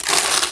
money; The Elder Scrolls III - Morrowind; Morrowind GOTY GOG

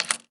rifle key insert; Papers Please

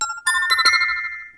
Alteration Cast; The Elder Scrolls III - Morrowind; Morrowind GOTY GOG

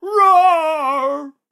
dino roar

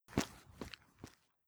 traveler walkout; Papers Please

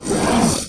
roar; The Elder Scrolls III - Morrowind

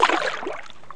swim left; The Elder Scrolls III - Morrowind

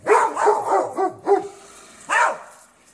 Riding Dogs; SyrinScape SoundsScape Collection; bbh Haunting part 1; TTRPG

